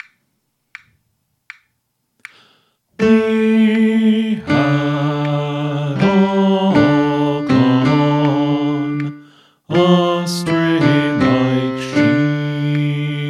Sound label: Refrain Tenor